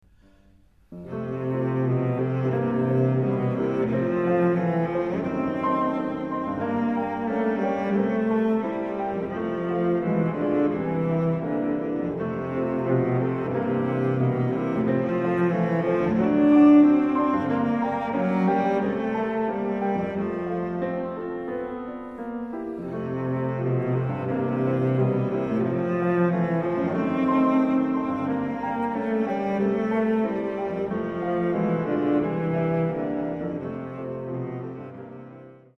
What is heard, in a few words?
melody